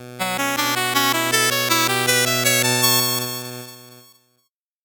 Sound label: 8bit jingle normal